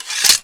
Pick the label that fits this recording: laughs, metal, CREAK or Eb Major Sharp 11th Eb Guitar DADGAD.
metal